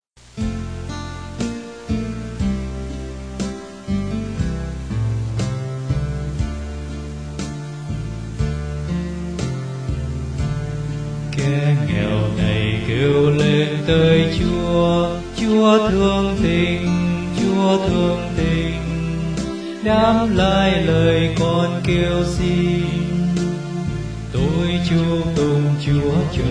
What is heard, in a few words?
BASS